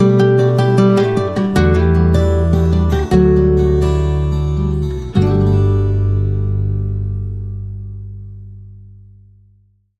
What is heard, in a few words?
Summer Sting